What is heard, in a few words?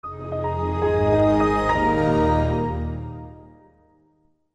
Samsung Galaxy Zoom